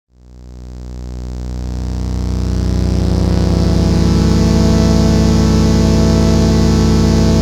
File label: bgm; ZeroRanger